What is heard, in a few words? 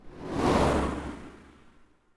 car drive by; Papers Please